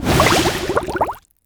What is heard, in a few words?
attack critical, whale; zoochosis